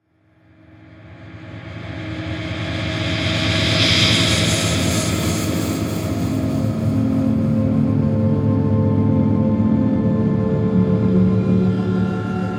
Composition Swell, Production, Element, Composition, Swells, Static, Wash, Feedback, Eerie